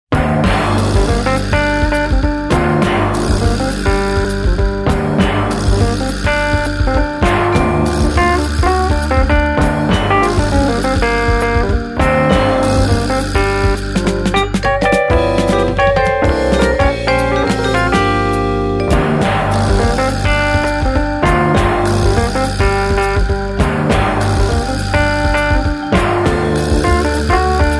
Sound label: Quartet, Soul